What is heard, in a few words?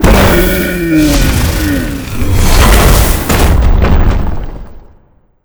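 boss death, monster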